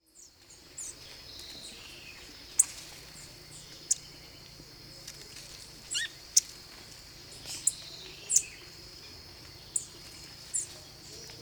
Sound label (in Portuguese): saíra de chapéu preto